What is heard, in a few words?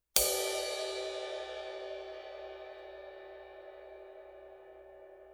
classic ride 20 bow, cymbal; sabian